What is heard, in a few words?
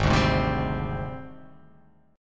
Ab Major 7th Flat 5th Ab, down; AbM7b5 strummed; JGuitar